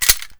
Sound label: clip tap; svencoop; weapons